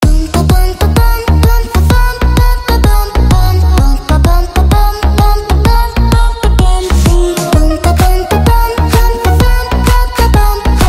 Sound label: the drum ringtone